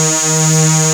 SYN RESON E4, SYN Analog; Sound & Vision - Gigapack I CD 2 (Roland)

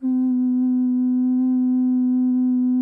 GEMSHORN TEN C1; SoundBytes - Early Patches

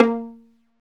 STR VL PIZ, Chamber Pizz; Roland L-CD702

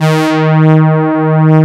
Brass Eb3; Mo' Phatt; Digital Sound Factory Mo' Phatt

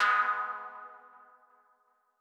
Mono DBeat G#3; KG MegaSaur; M8

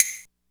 DR660 DANCE, DRUMS PERC; Rubber Chicken Software Sample Bank

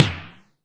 RESO SN, Snare; AKAI S6000 CD-ROM - Volume 4

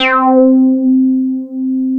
C5, Bass; E-MU Producer Series Vol. 6 – Analogia Project (CD 1); Boom da Bass 2

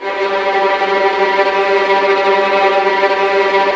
STR TREM, Vlns Tremolo; Roland - String Master Series

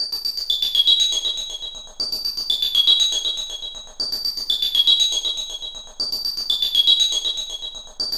HIQ BEATS; Zero G; Malice In Wonderland

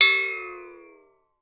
OHM PLATE; WATER FX

